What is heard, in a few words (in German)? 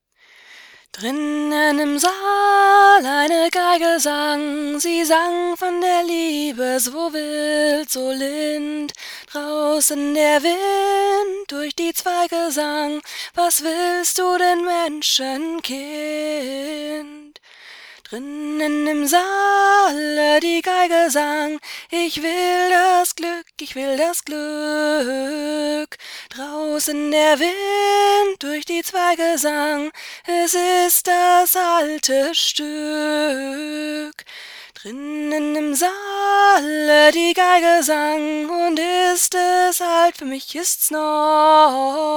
Wind & Geige